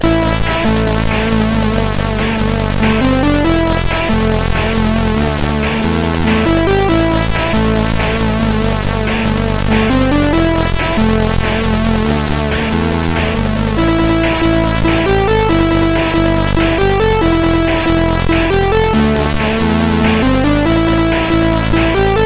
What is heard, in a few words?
sid CSG8580R5